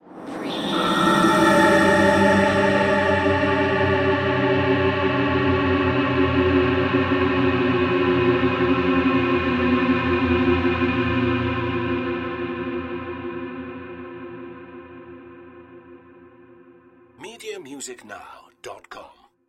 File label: Hit Burst